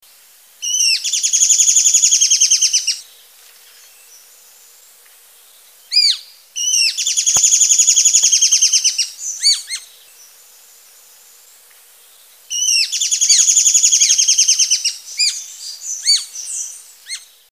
Spotted-crowned Woodcreeper

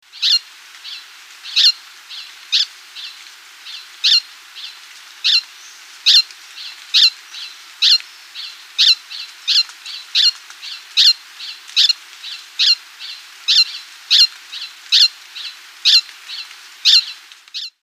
Green Hermit

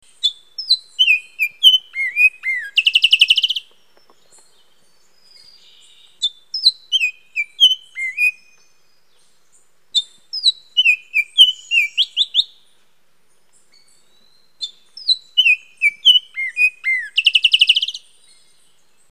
Black-thighed Grosbeak

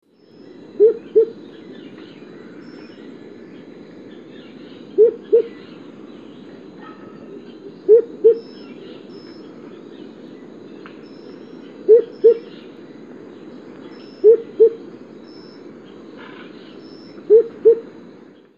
Blue-Crowned Motmot

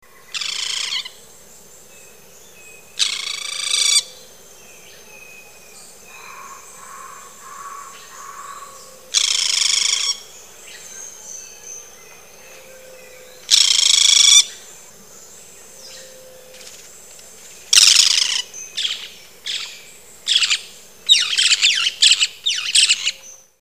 Boat-billed Flycatcher

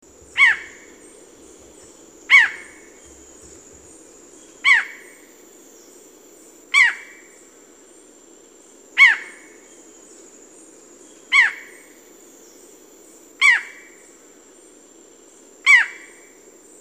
Barred Forest Falcon

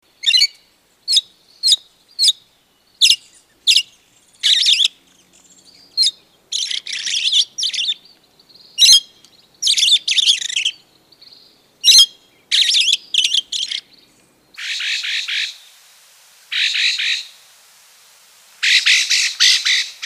Sooty Robin

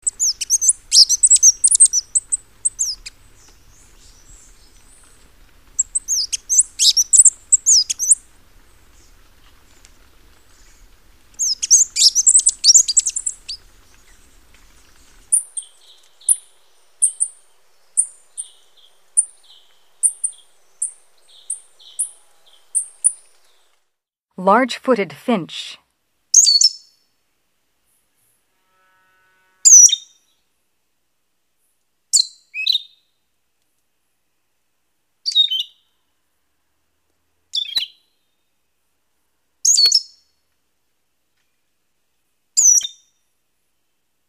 Sooty-capped Bush Tanager

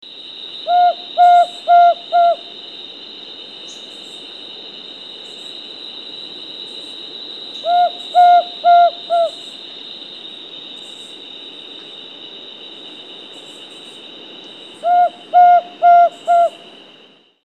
Bare-shanked Screech-Owl

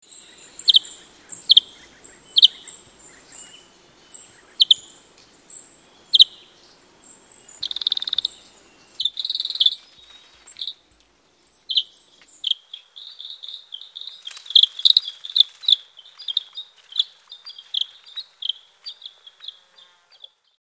Long-tailed Silky-flycatcher

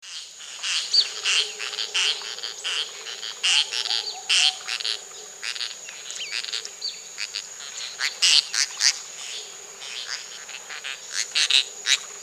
Masked Tityra

